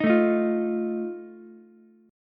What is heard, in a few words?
E flat Suspended 2nd Flat 5th, Ukulele; strummed; chord; 8,9,11,8; JGuitar